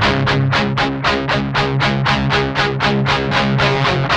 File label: 8TH D 3, Guitars; Spectrasonics; Hans Zimmer Guitars VOL-1; 128-JACKHAMR